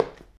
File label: footstep